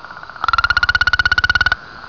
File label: new mexico spadefoot call; texas nature trackers; amphibian watch